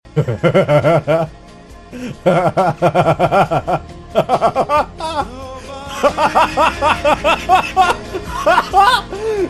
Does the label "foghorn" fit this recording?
No